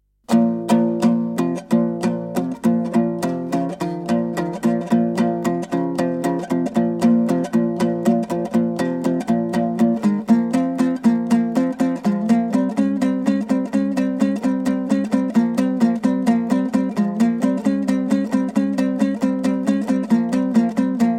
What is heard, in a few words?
Khalyk kuyi Kui; Kui kojan bol moldan bol kui toren bol karan bol barine de bir olim; B. Basigaraev; bakyt basygarayev